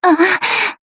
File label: gasp